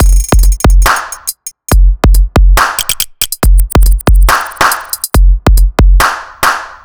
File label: Grime Tel C, 140 bpm